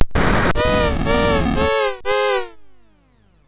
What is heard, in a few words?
Hardsync sid MOS6581R3